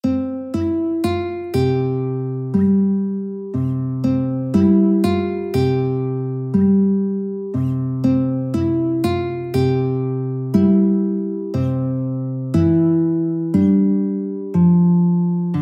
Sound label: guitar